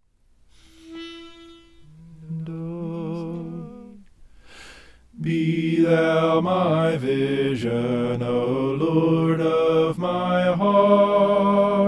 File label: soprano